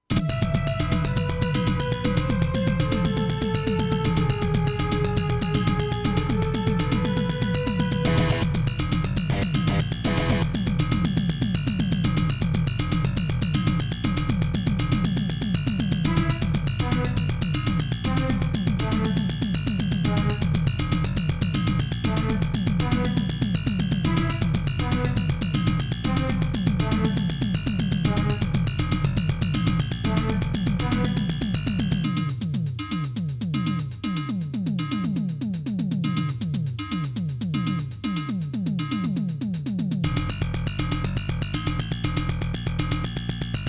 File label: SC880; Dune 2